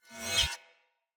Saw Dagger Whoosh Accent Heavy Long, Weapons Swords; Player